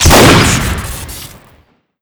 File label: revolver shotgun crit, weapons; tf2classic